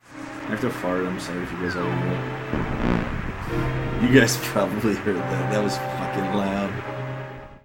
mutt fart

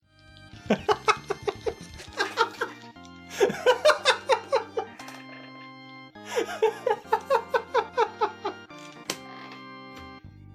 laugh

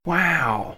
waow

